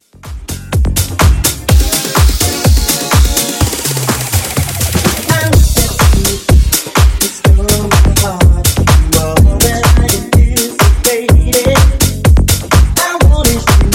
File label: Funk Edit 125 Bpm